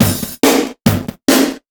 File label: Breakbeat; Vengeance Samples; VEC2 Loops